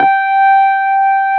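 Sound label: Wurlitzer G4 - L; USB Soundscan vol.09; AKAI; Keyboards Old School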